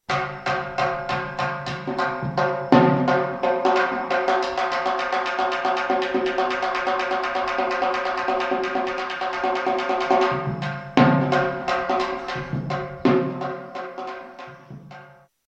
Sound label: naqqara; instruments